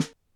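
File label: snare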